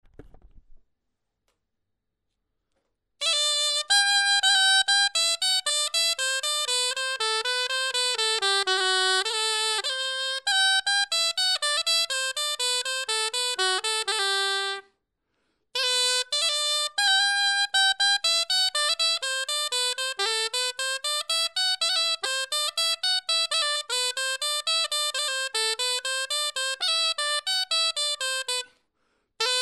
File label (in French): clarin etude